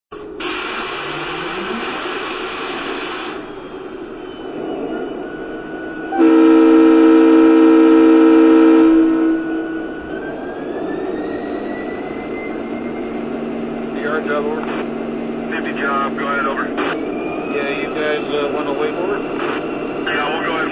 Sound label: SD70Mac, trains